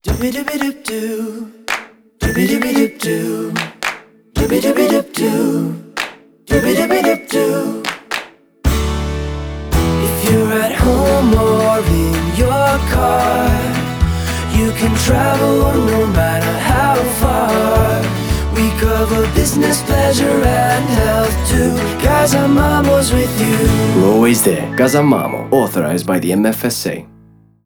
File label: Jingle; Gasan Mamo